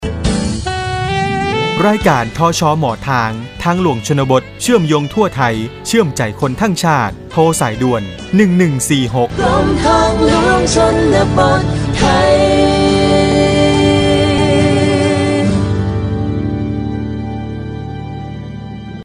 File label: Jingle; ภาคเหนือ